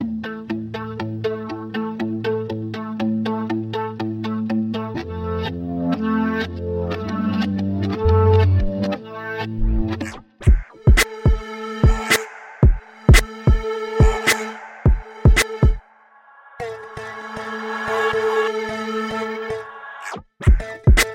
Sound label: beats